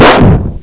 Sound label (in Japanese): pisy; みんなでバトル